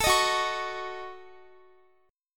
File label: Gb Minor 6th, Guitar; Gbm6; strummed; x,x,19,20,18,20